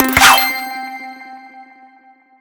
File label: HUD Distance Bonus